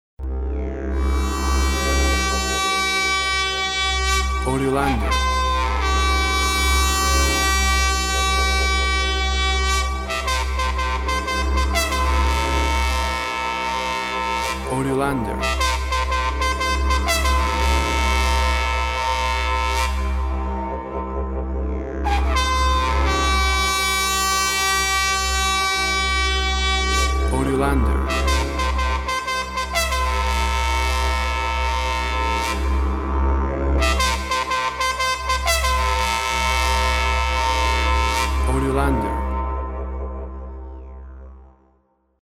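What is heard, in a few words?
ethnic winds trutruka didgeridoo; Magical sounds of the Mapuche ethnicity with trutruka and digeridoo.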